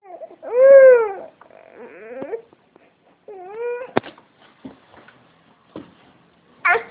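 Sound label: baby hungry, male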